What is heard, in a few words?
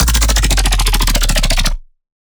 Bass Shot Spastic, Dubstep; Ghosthack; Advent Calendar 2018